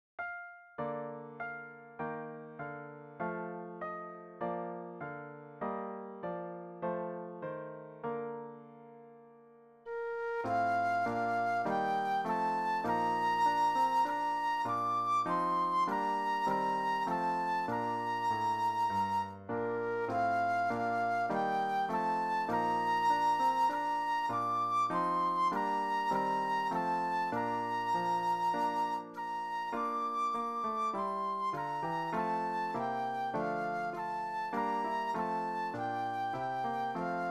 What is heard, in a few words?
Flute Solo